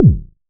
modular deep, kick; Ableton Foundation FreePacks

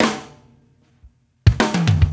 112 Funk Drums Fill; R&B Funk Soul

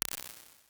Room, Medium Rooms, IR Samples; Convolution; NI Kontakt 5

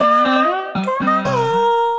Sliced Melodies (Root C), Sliced Vocal Phrases; Ableton; Factory Packs; Voice Box; Harmonic Vocal Racks

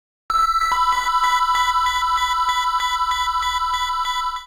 Hyper Lead E Minor 144 bpm; Ableton; Factory Packs; Skitter and Step

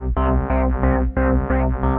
Aged Harmonics, Tense Pulses; Ableton; Mood Reel

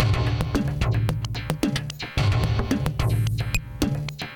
Acoustic Guitar Hits - 110 bpm; MIDI Clips; Drums; Ableton; Mood Reel

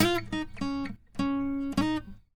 101 Pop Acoustic Guitar Db; Pop Rock

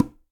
Bongo L Tip; Bongo A L Tip; Latin Percussion; Drums; Drum Hits; Ableton; Factory Packs

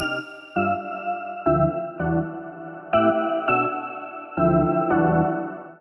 Eerie Organ C Major 165 bpm; MIDI Clips; Tonal; Synth; Ableton; Skitter and Step

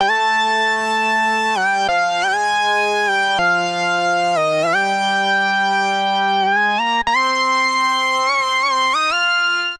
Alpha Lead F Major 98 bpm; MIDI Clips; Tonal; Melodic; Ableton; Factory Packs; Chop and Swing

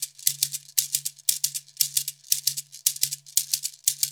116 African Shaker; World; African Percussion

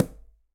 Quinto A Mute, Conga; Latin Percussion; Drums; Drum Hits; Ableton; Factory Packs

